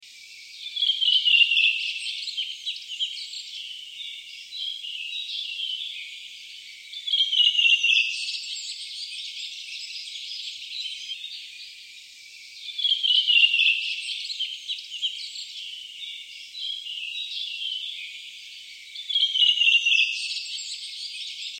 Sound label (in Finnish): punakylkirastas; laurinmaki